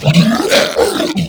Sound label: boss spit blob barf